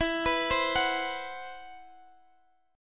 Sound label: C Major 7th Flat 5th E